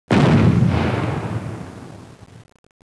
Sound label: Cannon